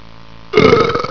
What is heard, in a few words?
belch